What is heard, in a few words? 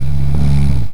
Snoring